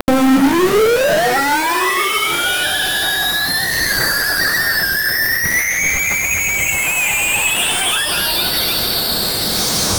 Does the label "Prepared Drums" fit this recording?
No